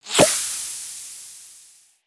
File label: Pets Skunk Stink Spray; creatures pets; LEGO